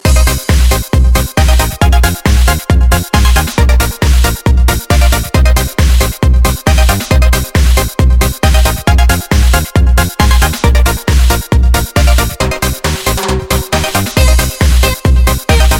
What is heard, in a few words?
Deep Radio Mix